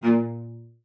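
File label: CELLO spiccato A#2; 90 s Sample CDs; Xsample VOL-5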